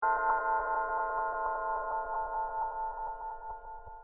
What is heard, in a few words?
bells test